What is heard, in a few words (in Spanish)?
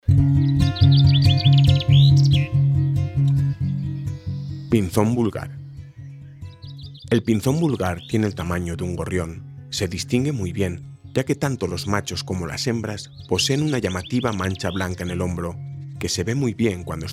pinzon; aves; lavanderas